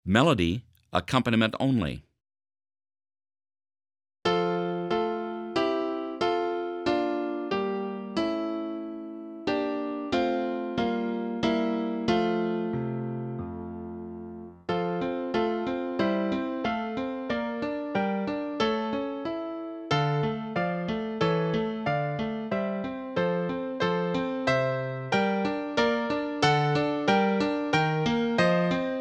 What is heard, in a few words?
french horn melody accompaniment only